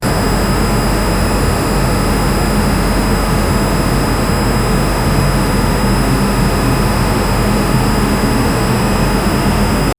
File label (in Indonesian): sound icu terdengar dari, medical equipment